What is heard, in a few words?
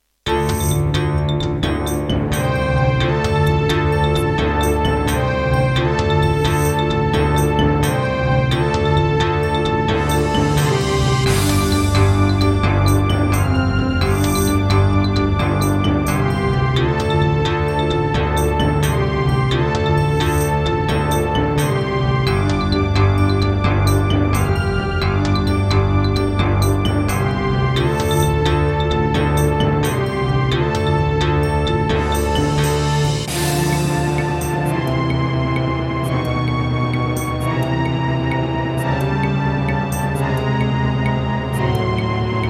Roland SD-50 GS MODE, wavetable; Heretic